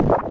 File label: bubble bounce